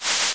horn move

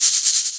move

